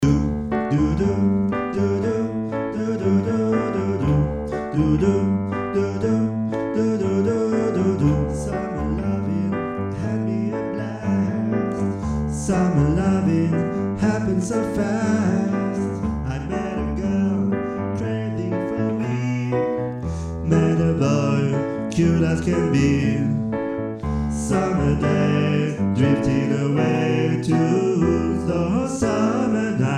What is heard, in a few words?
alto